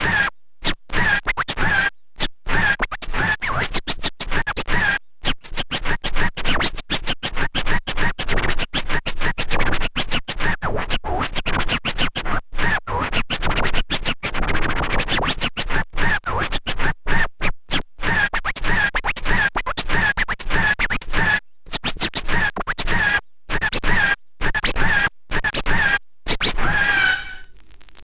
scratch long, Vinyl Attack, scratches

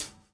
DOWN, DOWN KIT 78

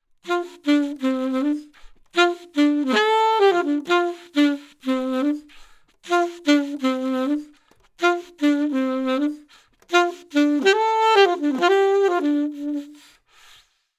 DISCO SAX, 124 BPM; Drumdrops; Compact Disco

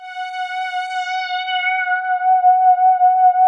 RESO F ST F#4, SYN STR

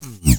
BASS SLID; E-MU Formula 4000 Series Vol. 1; Hip Hop Nation; Phatt 4000

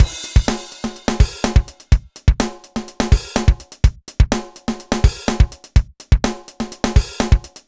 Punchy Drumloop; Drumloops; Dr. Fink's Funk Factory

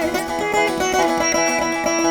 VEENA; Spectrasonics; Heart of Asia

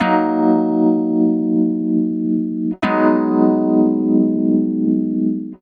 170, Keyboards, Drum&Bass; Total Drum&Bass; 90 s Sample CDs

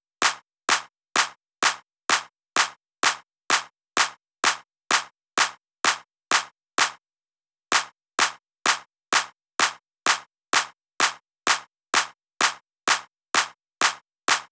Drum Loop 128BPM Clap Main; VEC5; Drumloops; Multi Loops

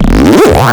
Trill; Cwejman S1; 300 Drum Machines; DevSnd Cwejman S1 - JK

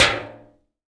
Tin Tray, household rhythm samples hits

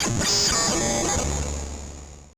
AMBI FX

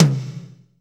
TOM, Drumscapes Roland

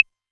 808 Clave; Drums

